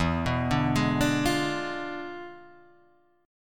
E 7th Flat 9th

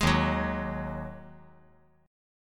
Eb Minor Double Flat 5th Eb; JGuitar

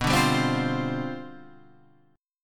C Major 7th Suspended 2nd Suspended 4th B, chord

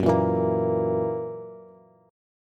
F sharp 7th, Bass; strummed; JGuitar

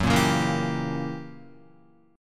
Gb 7th Flat 9th Gb Guitar; strummed; JGuitar

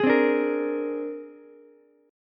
C 7th Suspended 2nd Sharp 5th, C, down, Ukulele; C7sus2#5 strummed; JGuitar

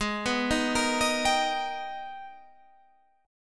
G sharp Minor Major 9th, Guitar, Standard; arpeggiated; JGuitar

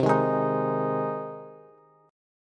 C Minor Sharp 5th C down Bass; strummed; JGuitar

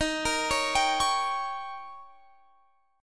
Eb 7th, Guitar, Drop D

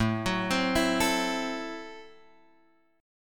A Minor 6th A Guitar Drop D

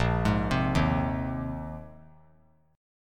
A sharp 9th Suspended 4th A sharp; arpeggiated; JGuitar

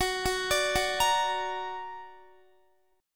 Gb Augmented Guitar; Listen to Gb+ arpeggiated

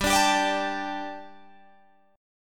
G Suspended 2nd, Guitar, Drop D; chordshape; down

